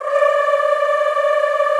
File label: D4, Extended Classical Choir; Best Service